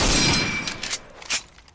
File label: M1 Garand Ping